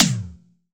EL TOM, GM drum set; AKAI S-Series CD-ROM Sound Library VOL-8

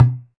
Tabla Baya Gin; Roland BK-5; Percussion

